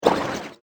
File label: Monster Liquid Attack; fantasy sound effect; role playing games, magic videogames; Andrew Fleming